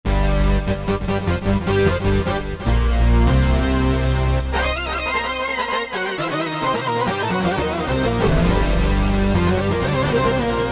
2 voices; Korg MSC-12S Synth 3

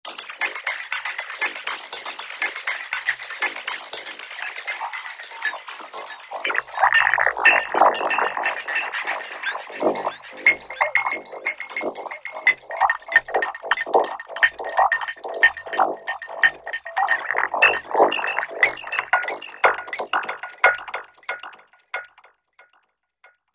Artefacts, Roland V-Synth; Factory Patches

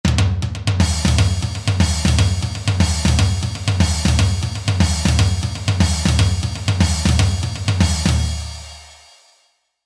Riff

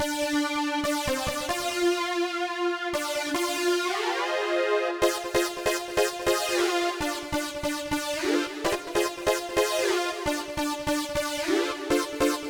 Bender Lead, Korg Radias-R

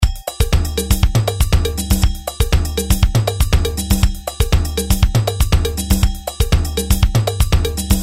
SALSA, Roland R-8

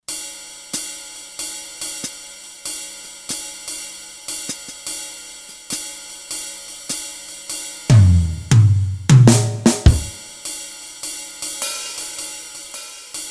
JAZZ, Factory Rhythm Sets, Roland SC-880

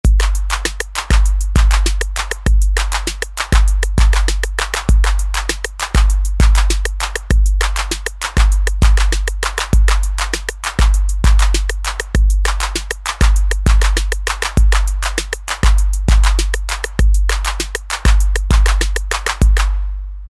Electro, Roland TR-808; Custom rhythms

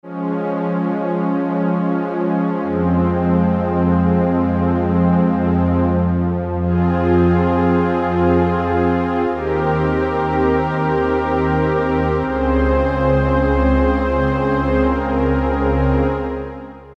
Pad, Roland JP-8000; Juno B81 Pad; Factory Patches

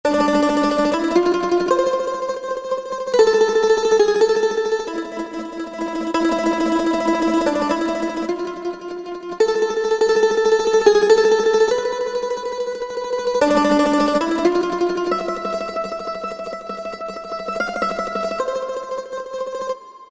Mandolin, Yamaha PSR-3000; Factory Voices; Guitar & Bass

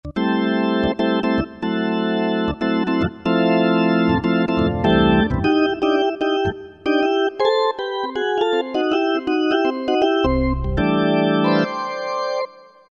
Full Organ, Korg 05RW

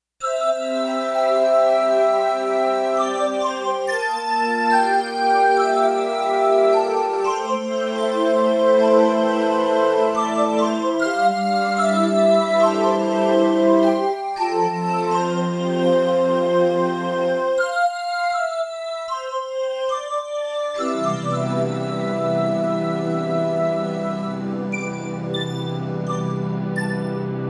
Glocken Bell; Digital Synthesizer

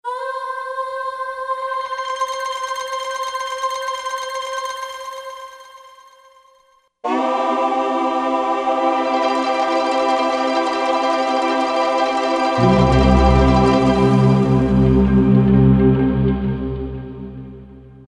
Korg M1; Factory preset examples; Wait...

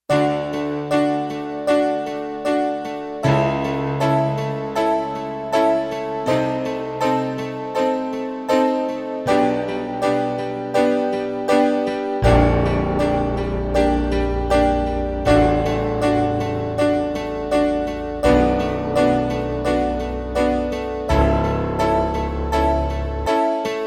Six Pianos; Digital Synthesizer

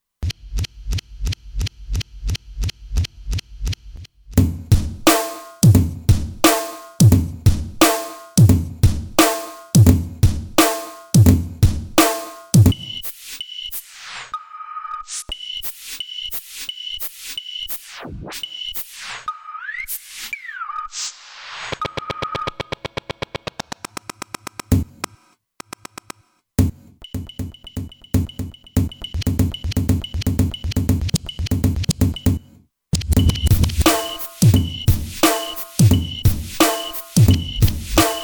Techno Loops, Kurzweil K2500X; Factory Programs

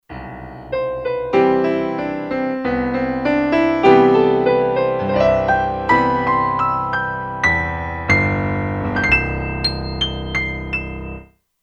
Default Program, Kurzweil K2500X; Factory Programs

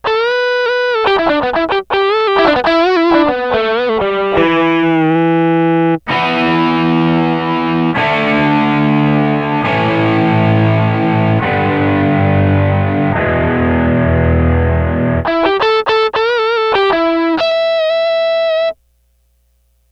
rock guitar 5ths solo rock guitar

